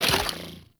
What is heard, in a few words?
Democracy Fire Stop; Warframe; Caliber Chicks Minigame; Weapons